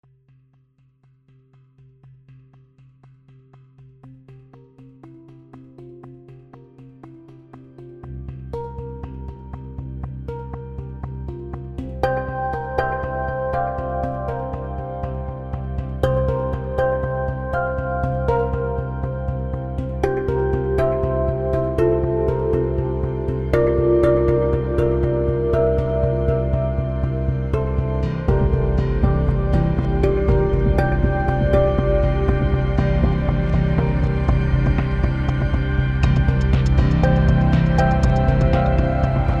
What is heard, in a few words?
pandrum; PANDRUM v2; CINEMATIQUE